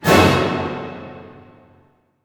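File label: F# diminished fortissimo, orchestra hits; Sound On Sound - Peter Siedleczek's Orchestra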